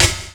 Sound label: Snare